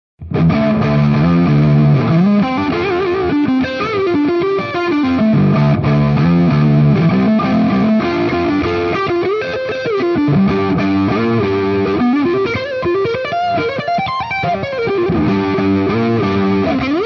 Low Lead

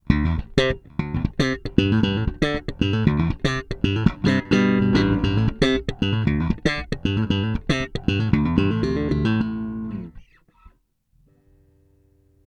Middle bridge parallel slap

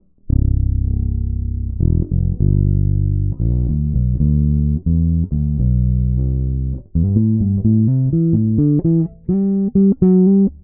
Neck Pickup Tone closed

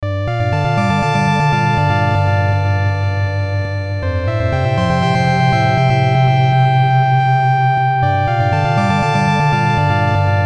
CMI V Spectral Synth Italo Emotions; Arturia

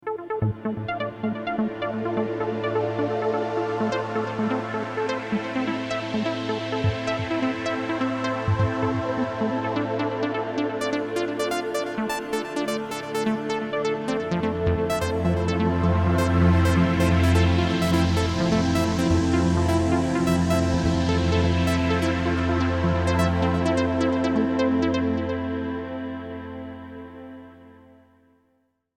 iridium hydra XFade Reverb

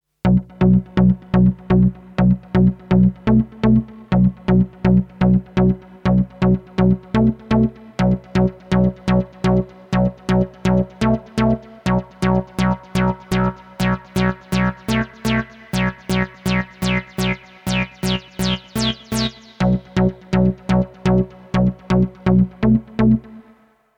Duophonic; Behringer CAT

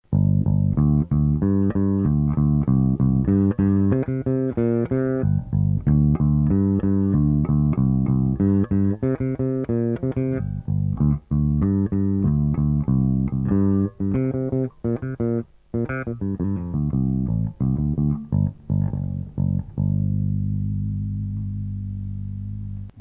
lowdown

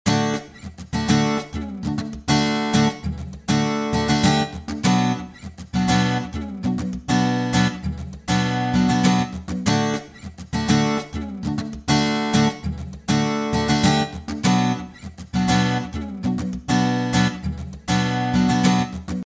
Acoustic Guitar

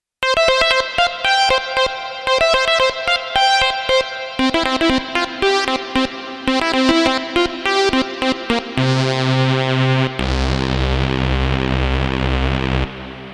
STREICHFETT

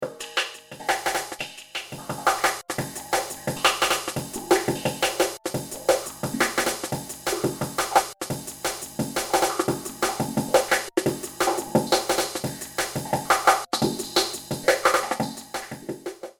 Beatloop; Murf

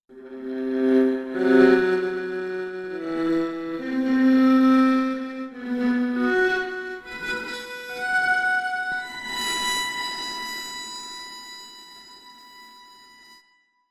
Emotional Viola Morbid Sustain

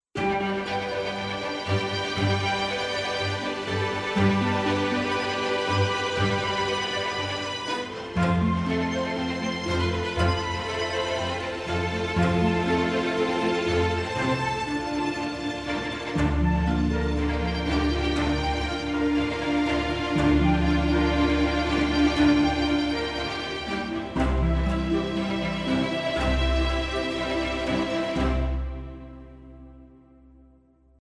Winter Orchestra Epic; TO Animated Orchestra

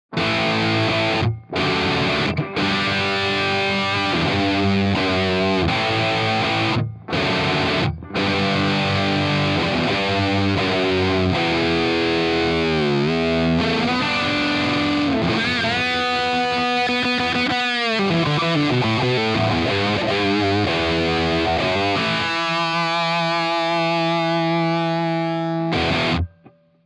Martial Normal High Pushed; Audified ampLion 2

